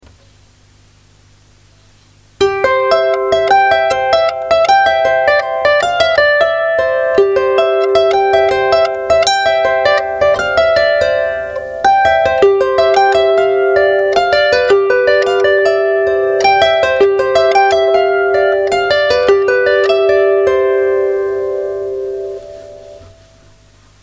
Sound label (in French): mazurka